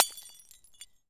bottle break

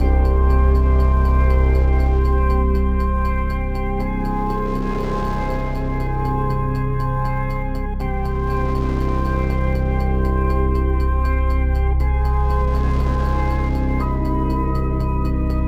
Ambient verb; dying light the bozak horde gamerip 2015

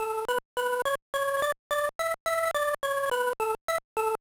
Jingle, gba; backyard baseball 2002

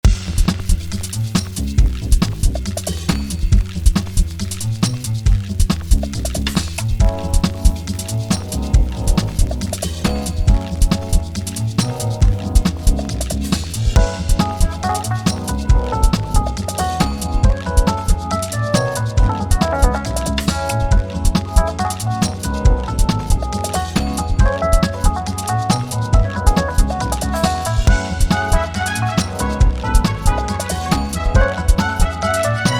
Pause Menu

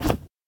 Weapon Flag Start; pulling no punches

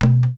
bucket; lego my style preschool

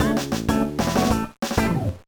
Jingle, gba; Backyard Baseball 2006

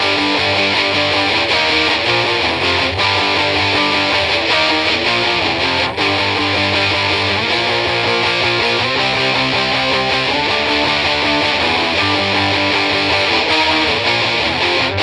Guitar

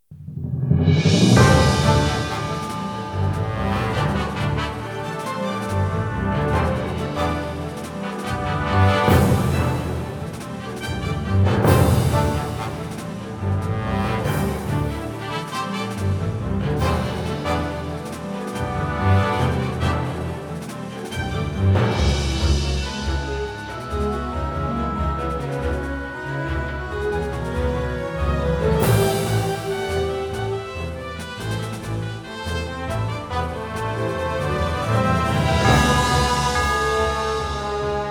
Battle Standard Battle

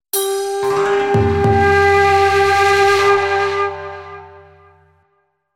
failure jingle failure; Kengo 3

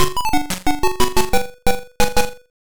Yakyuken Game Win; mahjong sisters; toaplan; arcade

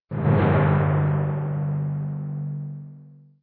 Tymp Hits

